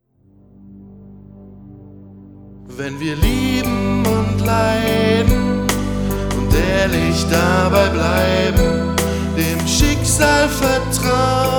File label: jingle; meinleben